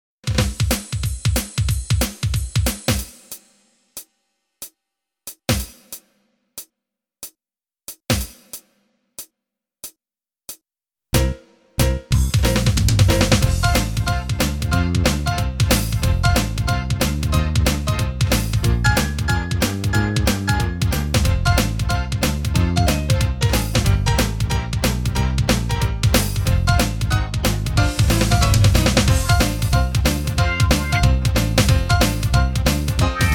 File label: brass minus